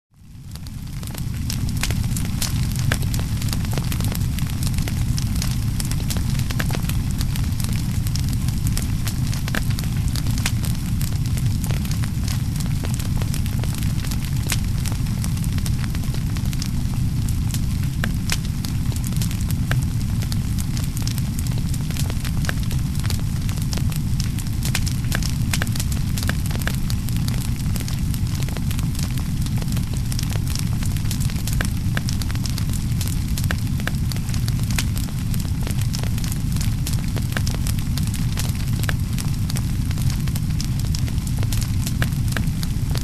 Fire burning and crackling close

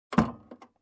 Car Interior Door Close

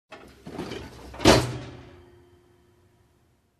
Kitchen Rack slam

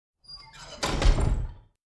Creaky Door close

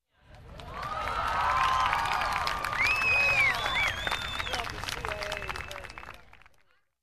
Audience watching a game cheering

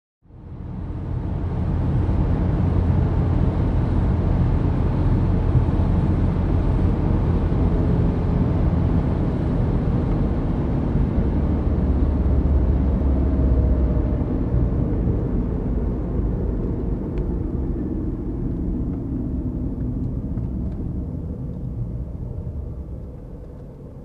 Car parking from interior

